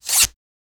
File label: a crisp clean sound of, cards